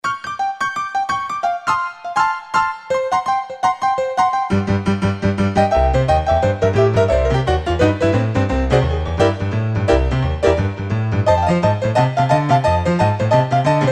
AP Bright, Yamaha TG77; Factory Presets

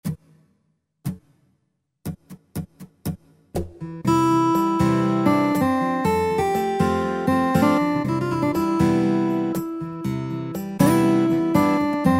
Unplugged, Pop & Rock

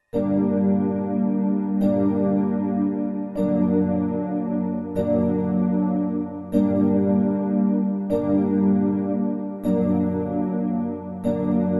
Asian Fantasy; World; Roland SR-JV80-14 World Collection Asia

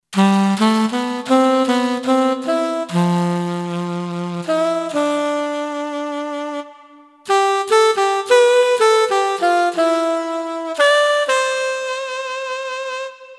Sweet Tenor; Yamaha PSR-550; Voice

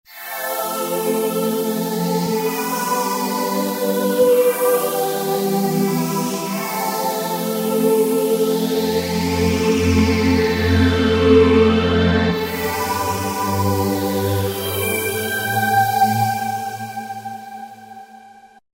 Silver string, Roland V-Synth; Factory Patches

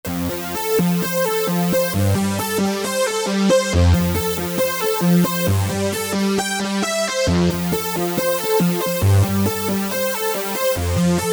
Synth, Korg MS2000; Factory Patches

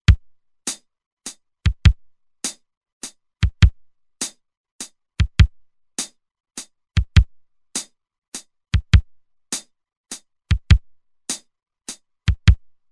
Waltz (Triplet), Roland TR-505; Factory Rhythms

